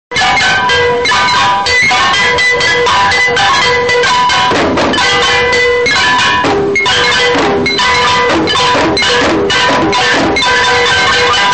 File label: taiko; omagoe; geinou; oomagoe